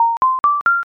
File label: score up